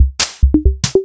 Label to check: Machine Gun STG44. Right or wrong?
Wrong